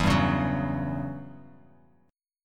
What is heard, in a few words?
E Minor 6th Add 9th E down Bass Standard; Em6add9 strummed; JGuitar